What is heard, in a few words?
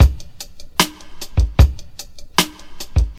76 bpm 2000s hip hop breakbeat c key